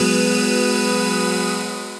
Clang Swarm; Effects; Ableton; Factory Packs; Granulator III

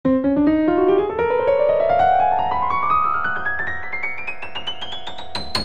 Phrase Chromatic Run C 85bpm, Grand Piano; MIDI Clips; Ableton; Factory Packs

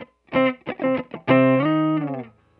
092 Chill Pop Guitar Rhythm Cm; Pop Rock; Chill Electric Guitar

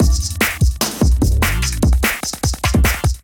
Kit Melodic 148 bpm, Drums, Complex; MIDI Clips; Micro Tuna Kit; Ableton; Factory Packs; Skitter and Step

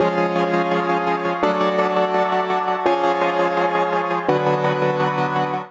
Gb Minor 84 bpm; MIDI Clips; Tonal; Accompaniment; Ableton; Factory Packs; Chop and Swing

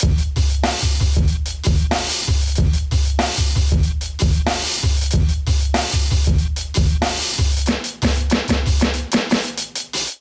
Groove 94 Bpm, Drums; MIDI Clips; High Rise; Ableton; Factory Packs; Chop and Swing

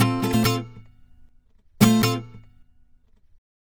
Pop Ac-Guitar, F, Acoustic Guitar; Pop Rock

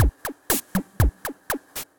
Kit, Effected Drum Kits; Drum Machines; Ableton; Factory Packs

